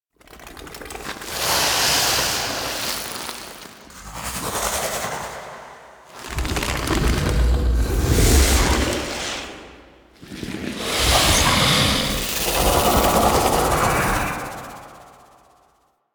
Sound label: Close Vocals; Nira